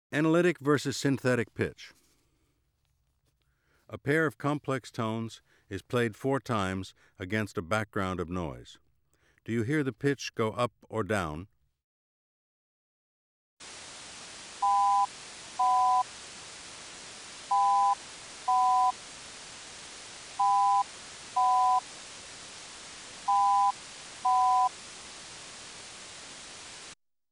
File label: Analytic vs synthetic pitch, Auditory Demonstrations